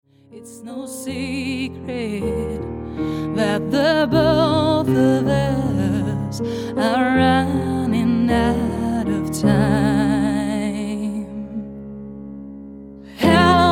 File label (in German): nur mit Piano